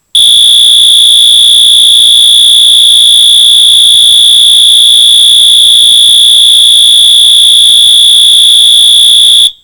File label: Horn